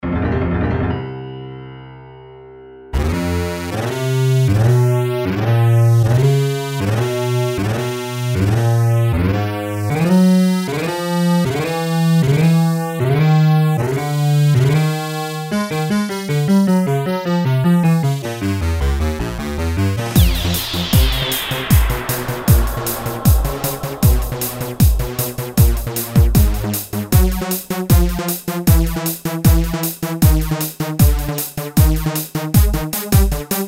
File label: Battle Haunted Mix; Dragon Warrior I